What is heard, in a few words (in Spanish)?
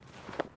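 Pasos zapato cesped, shoe footsteps on grass; yesterday origins gamerip 2016 switch ps4 pc ios macos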